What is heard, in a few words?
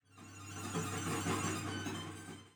heartbeat; superstriker